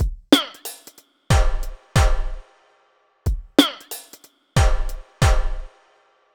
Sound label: deep beat 92